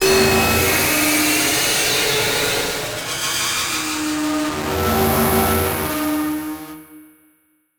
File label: Distortion Flutter Whine Feedback; Production Element Title Imaging